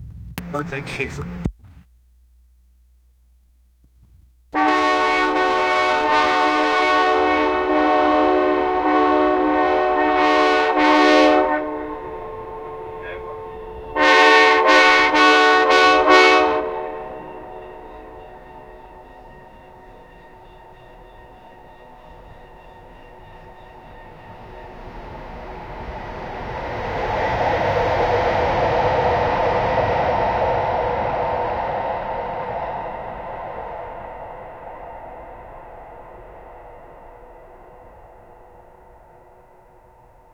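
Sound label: train long in from distance, departure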